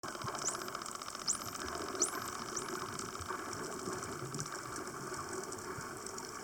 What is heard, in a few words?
whistle; dolphin